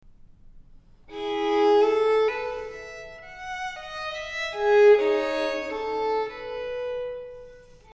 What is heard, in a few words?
sample vn